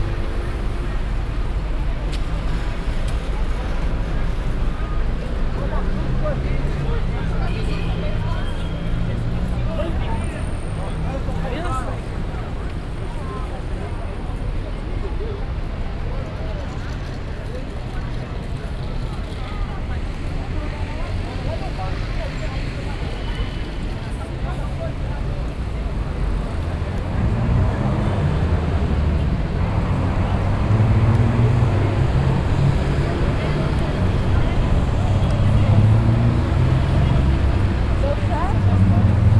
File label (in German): an einer ecke in sp, Brasilien; Fieldrecordings